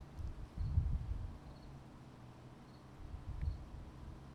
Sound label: Crickets; field recordings